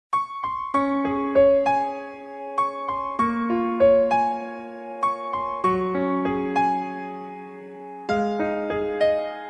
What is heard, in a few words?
Sad Piano Sms